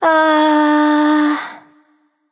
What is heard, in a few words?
confused; smite; aphrodite